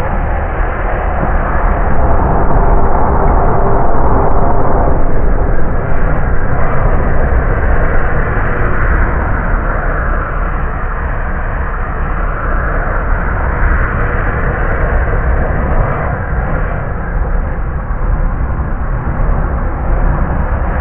Refinery

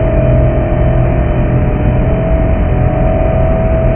Laser Charge

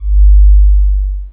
Collision

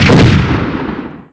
Auto Canon Fire Ext

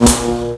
Laser A Fire

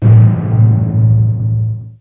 Collision

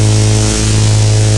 Laser Exp Int

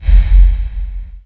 Collision

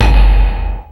Rivet Fire Left

